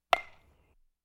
Sport Baseball Aluminiumschlaeger trifft Ball

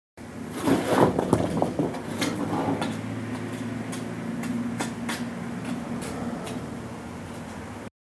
Schaufel

